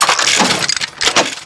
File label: robot noise; drebber